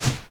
Flip Hopper Jump

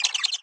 Wiggle

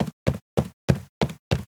Fat Sentry Run Loop

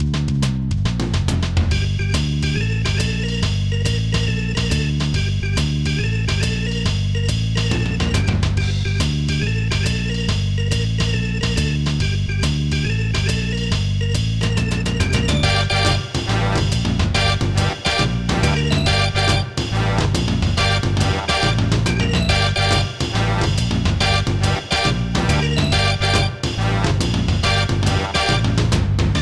Elevator Music, Caverns; goldeneye 007; n64; fan soundtrack; Elevator Music #2 - Caverns

